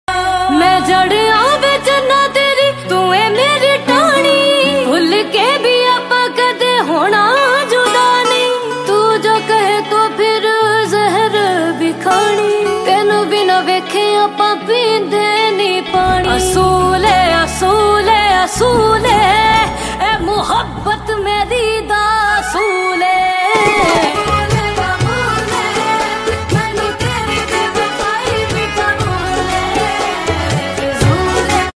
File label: Sad Song Ringtone